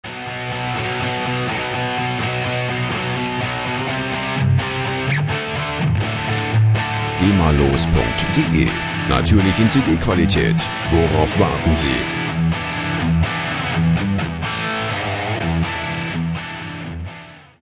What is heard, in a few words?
overdrive guitar